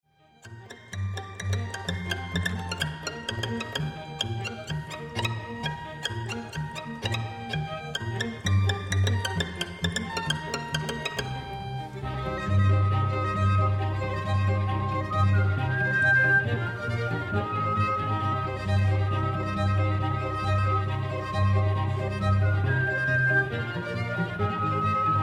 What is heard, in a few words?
string quartet